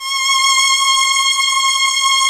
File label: STR LUSH S, MIDI Section